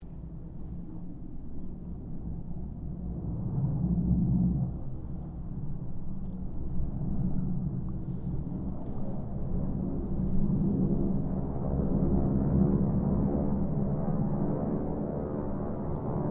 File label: Harvard squadron 4 pass distance, stereo; Mixed aircraft squadrons; Airplanes; Vehicles